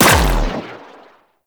Briefcase Akimbo Pistol Alt Shot; Warframe; Corpus

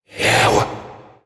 Possessed Red Veil Vocal Attack; Warframe; PriestQuest

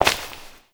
Step Rock; Warframe; Koumei

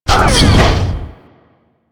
Leech Flaps Close; Leech Osprey

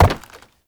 Step Wood, Movement; Uriel; Warframe

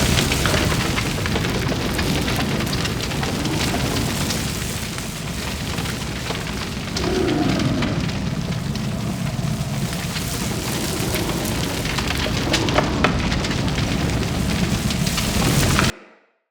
Root Cave Root Small Loop, Ambience; Props; Neathuns Root Cave

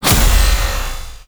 Venus Hoverboard Race Gate Hit; Warframe; Vehicles; Hoverboard Racing

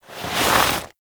Arm Lift Foley; Warframe; The Second Dream; Stalker; Cinematics

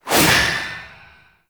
Void Heavy Scythe Alt Whoosh; Warframe; Duviri; Incarnon Adapters; Melee

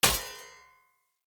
Short Sword Throw Medium Impact Metal; Weapon Impacts; Player; Swords; Environment Throw

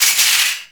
Shopping Cart Hit; Ambience; Gameplay; Warframe; Nineteen Ninety Nine

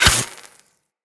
Melee Dagger Impact Robotic Flesh

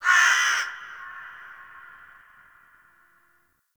Forest Creature Vulture, Ambience; Warframe; Grineer

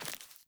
Sandals Run Vegetation, Footsteps

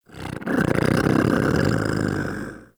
Combat Vocal Idle; Warframe; Catbrow

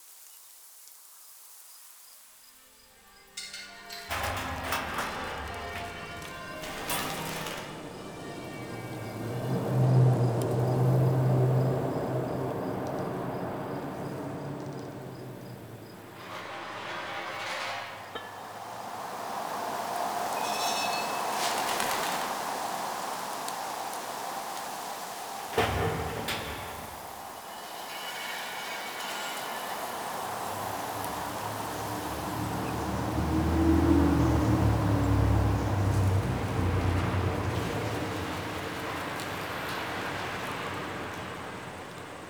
Fall Exterior Large Bed Loop, Ambience; Warframe; Nineteen Ninety Nine

